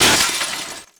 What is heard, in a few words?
light destroyed; Angry Birds